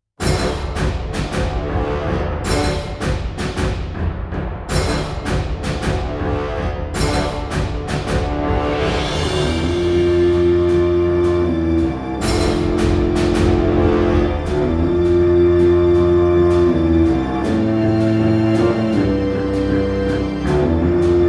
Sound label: contrabassoon